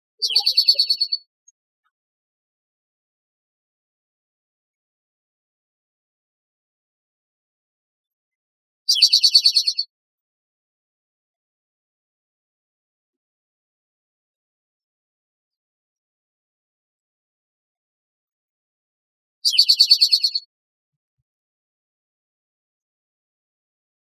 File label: SPTO trill; from northern Oregon, not decending in tone but in volume